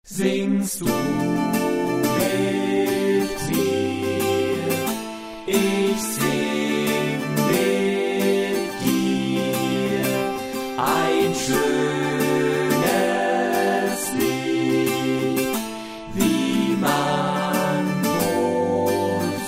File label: vocal example, chor